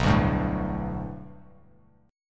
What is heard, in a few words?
B Minor 7th Sharp 5th B Bass Standard, chord; 7,5,5,2; Bm7#5 strummed; JGuitar